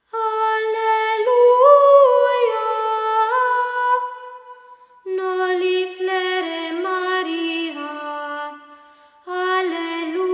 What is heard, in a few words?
alleluia noli flere